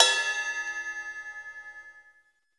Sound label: KOMMERZDRUMS